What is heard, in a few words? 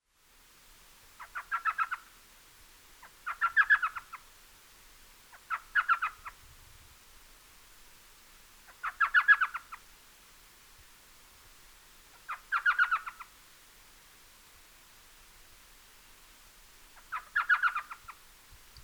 Elf Owl Micrathene whitneyi